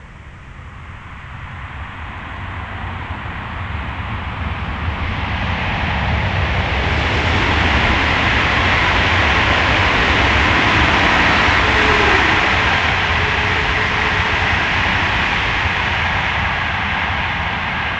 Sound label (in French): Pass Tgv Eloigne, TGV; French